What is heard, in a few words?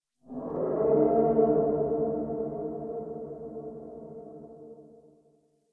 Exterior Distant Calls Scared; Ambience; Duviri; Warframe